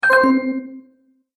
Magic Purchase Brief Ding; Cartoon Sound Design Sound Effects

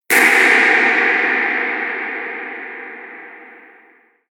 Epic Crash Bang, impact; Miscellaneous Impact Sound Effects; Impact / Explosion Sound Effect

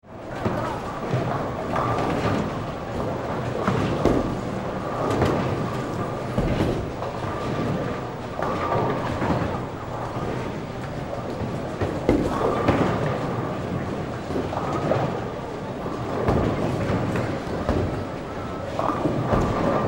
Ambience Bowling Alley Sports

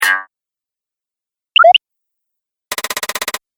Videogame Fx; Sound Pack 4; Video Game Sound Effects

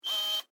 Security Camera Servo, Auto, Focus, Short; Technology; BlastWave

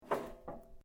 metal rods move

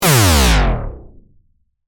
Hit Laser Descend, Warble, Accent, Transition; Hit, Laser, Descend, Warble, Production, Element, Imaging, Accent, Transition; BlastWave

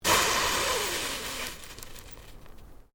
FIREWORKS EXTINGUISHED INTO WATER; Domestic Fireworks

